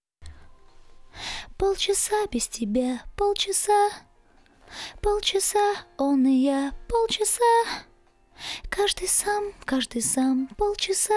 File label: Lead Chorus